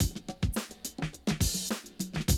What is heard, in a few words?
Classic DrumLoops